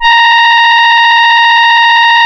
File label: A#, Synclav FM, BRASS; Big Fish Audio